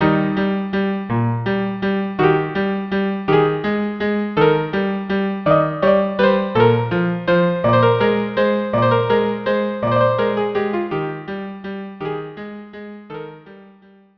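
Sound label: Valse viola cello; La Traviata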